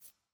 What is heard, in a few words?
lobby swap; tft; doubleup